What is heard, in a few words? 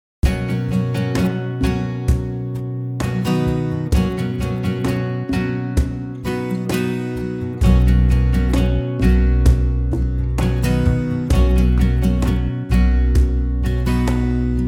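B, backing track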